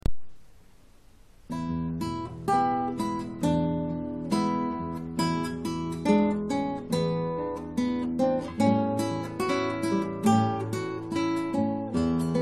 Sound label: guitar blues